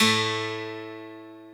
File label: 12STR, PIANO